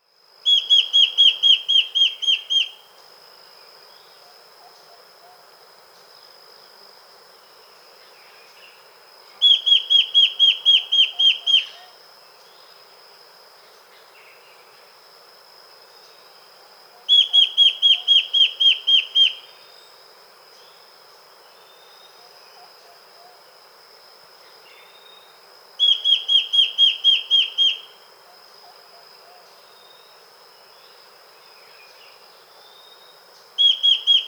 Song and call, Birds of the Costa Rican Cloud Forest